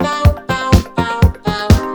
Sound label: vocode, audioloops